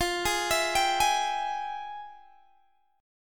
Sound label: F Minor Major 9th, Guitar Open D, chord